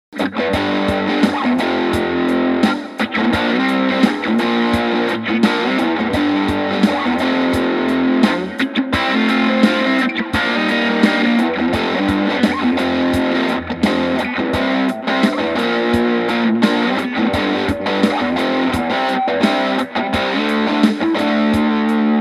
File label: Vox Mini Go Vox AC30TB Delay